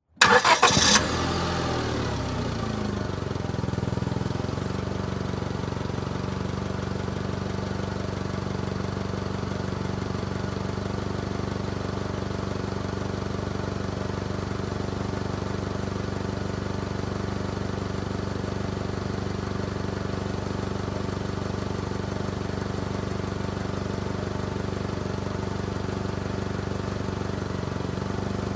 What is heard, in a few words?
Transportation Cars Honda Civic Start Idle Off Mic Under Hood